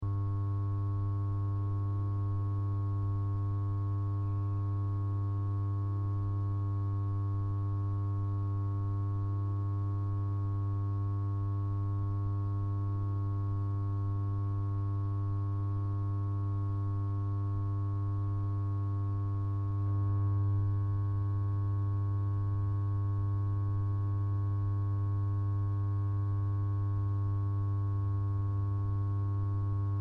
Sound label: fan faulty electric hum loop stereo